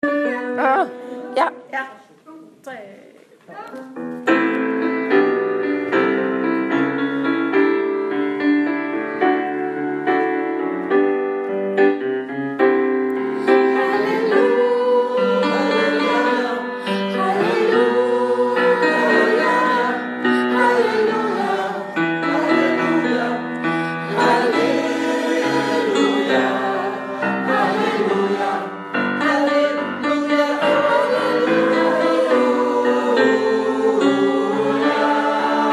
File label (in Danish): halleluja tenor bas